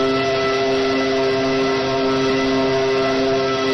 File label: engine maximum rpm